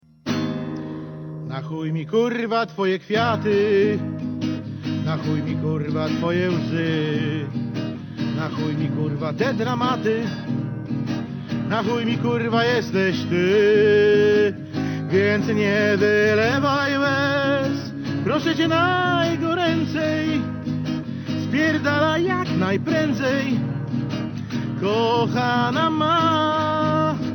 Polska Piosenka

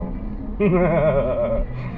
SMIECH DRAKULI

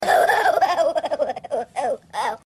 Smiech

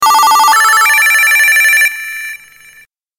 Telefon Biurowy

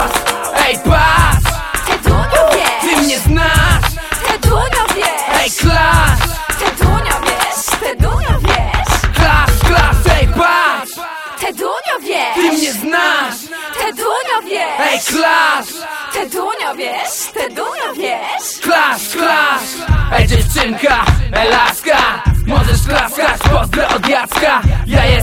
Klaszcz

